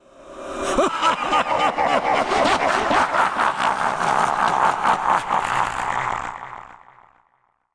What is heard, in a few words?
cackle; baal